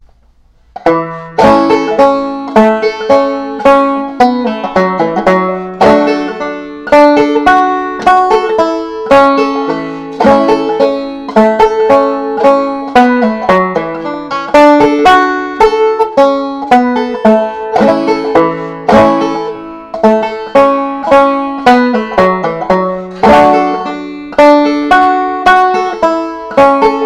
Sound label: bill cheatham, banjo